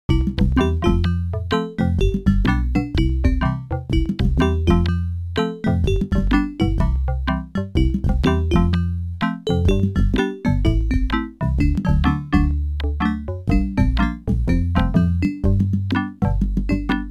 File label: OPL2; The Lookout